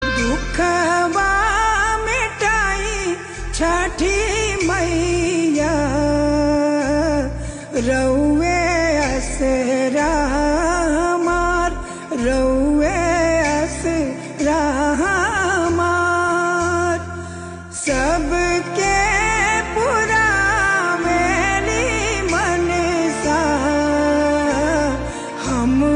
Chhath Puja, bhojpuri ringtone

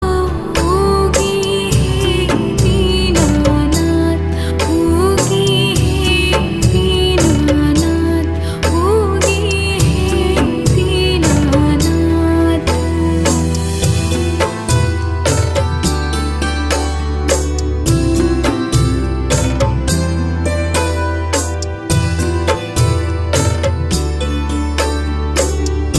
Chhath Puja, bhojpuri ringtone

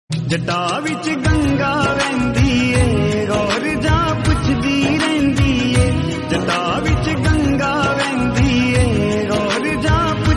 Ringtone; himachali

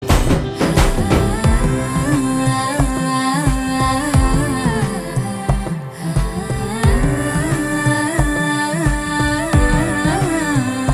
Song Bgm, telugu

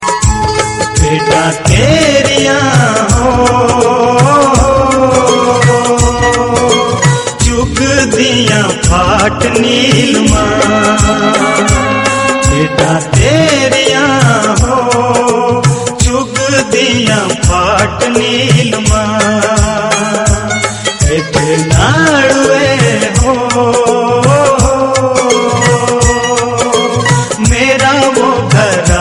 Bheda Himachali Ringtone

